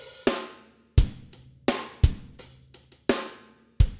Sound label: drums; musdb18